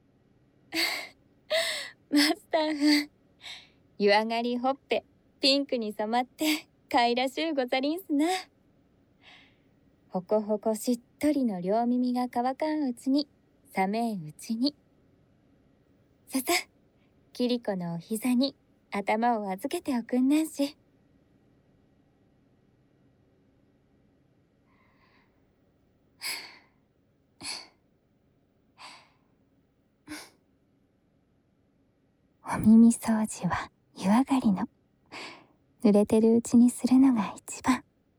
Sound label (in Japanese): 耳かき綿棒の両端をフルに使って丁寧に（右耳）; ほかほか湯上がりのお耳掃除は、お耳に優しい